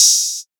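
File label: open hat, Cymbals; Hip Hop and Trap; Ghosthack; Hip Hop and Trap Freebie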